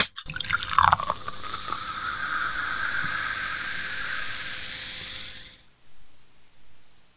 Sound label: soda